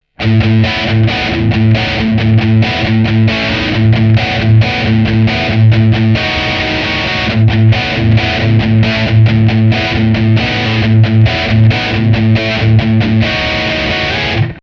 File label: Heavy Riff